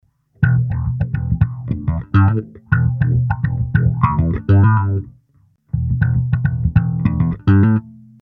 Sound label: phaser